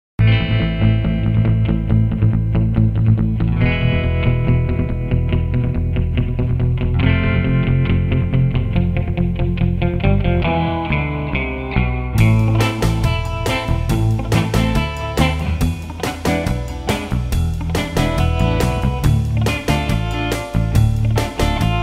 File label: Race Music Classic; Need for Speed 5 Porsche Unleashed; PSX